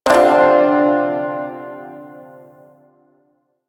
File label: Shawzin Min Chord D, Music; Chords